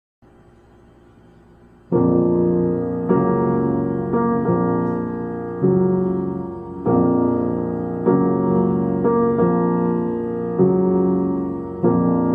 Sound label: Funeral March Chopin op. 35, pianist; composer; State Theatre